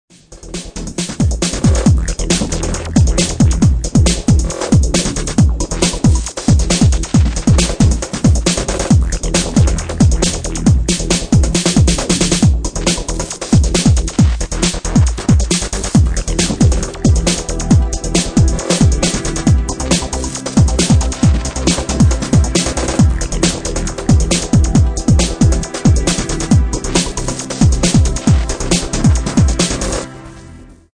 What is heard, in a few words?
Electro Jam